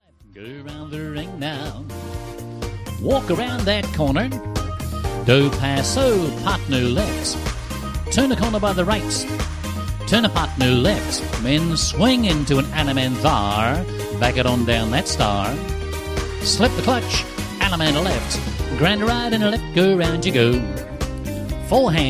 Hoedown Patter

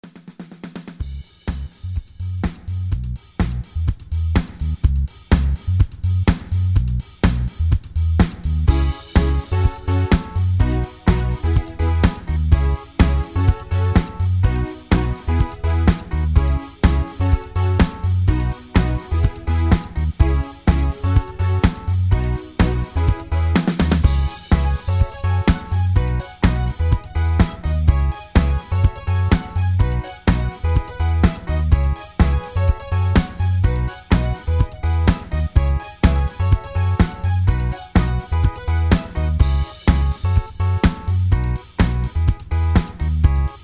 Instrumental

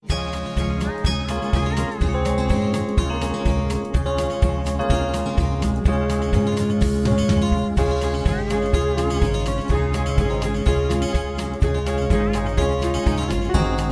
Low Key

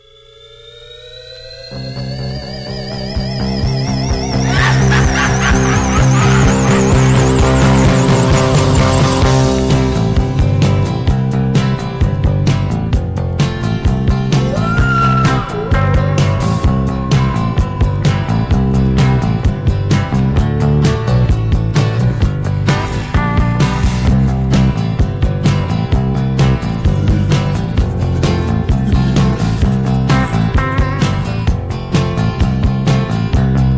Instrumental

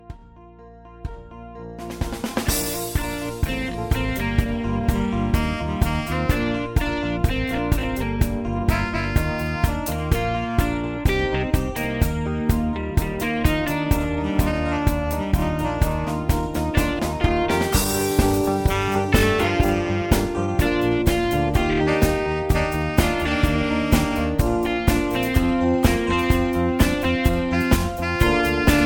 Instrumental Higher

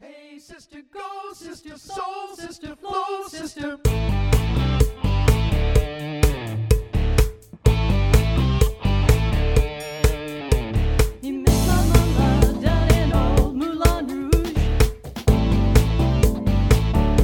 Instrumental with Harmony

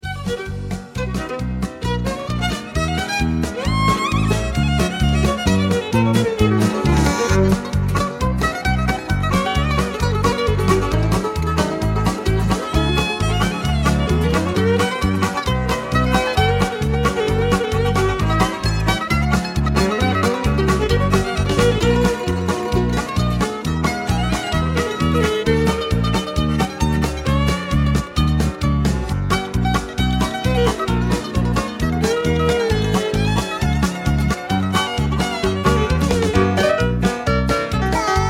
Instrumental Low Key

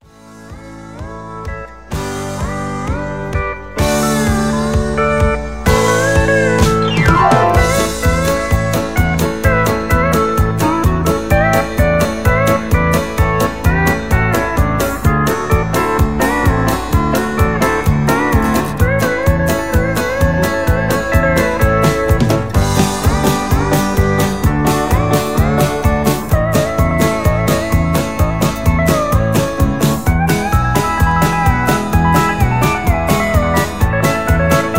Patter

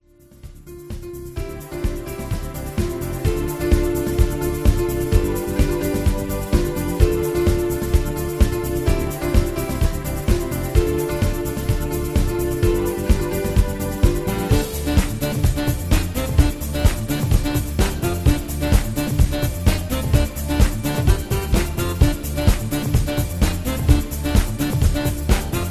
Tribal Hoedown Patter

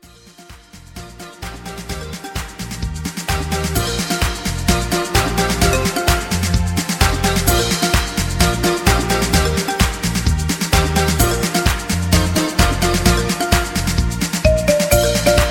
Instrumental With BGVs

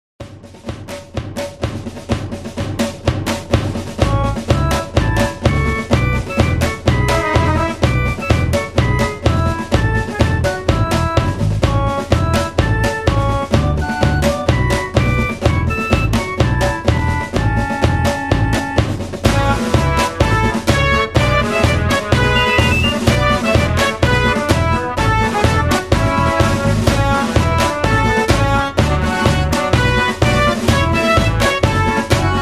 Hoedown Patter Low Key

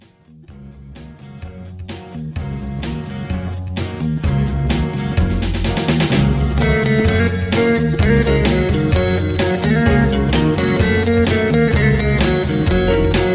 Boogie Low Key

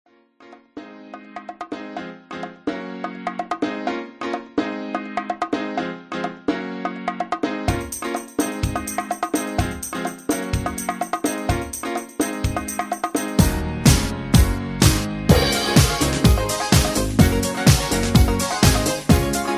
instrumental

